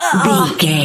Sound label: voice female hurt pain choke